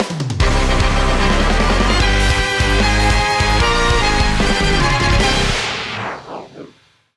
Win Jingle

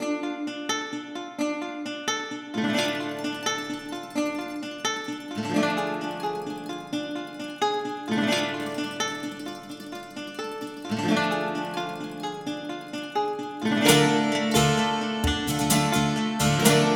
Music Magic Defense String; 7 Mages

